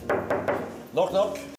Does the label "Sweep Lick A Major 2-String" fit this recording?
No